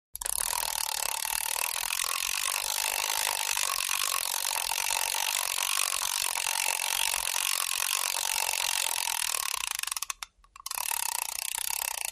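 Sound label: Fish Reel Heavy Spin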